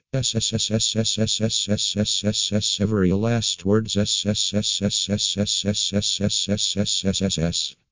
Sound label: sssssssss